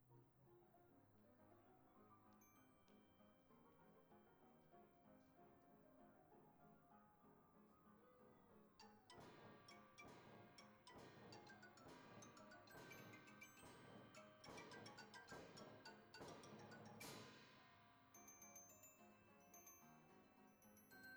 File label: muzic; saperGame; saper classic